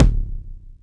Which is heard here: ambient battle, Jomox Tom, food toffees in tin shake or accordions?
Jomox Tom